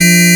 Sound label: FM HONK; Q Up Arts; Dream Experience; DRUMS PERC